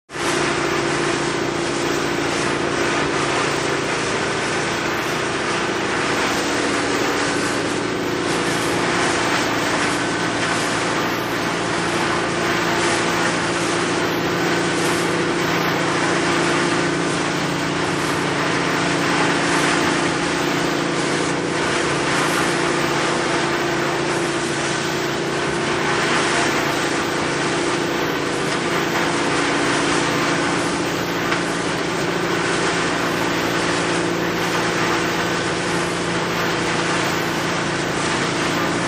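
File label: Grinding Mulch Machine, mulcher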